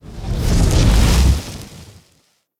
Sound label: fire charge big; skyrim